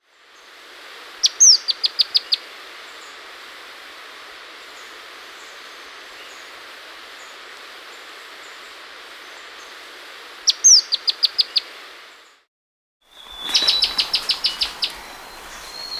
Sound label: Olive Flycatcher (Mitrephanes Olivaceeus), Andean Birds; S. America